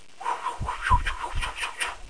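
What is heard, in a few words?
woosh; Education Publishing Concepts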